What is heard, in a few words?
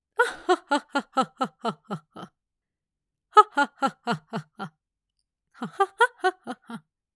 Empress Laugh; Gaming